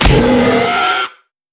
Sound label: Boss Hit Light; netent